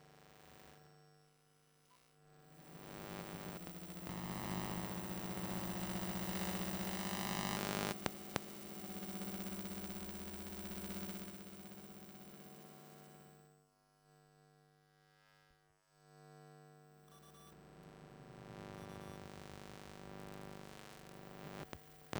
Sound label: Electromagnetic Field, Electro; electric fields